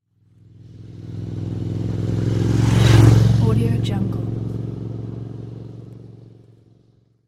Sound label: dirt bike medium pass by